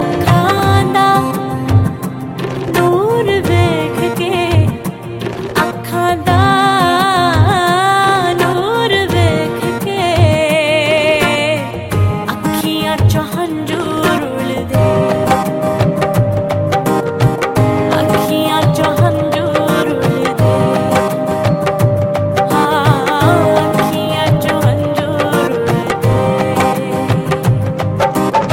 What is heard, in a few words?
Acoustic